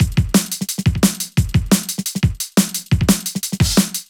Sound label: amen break midi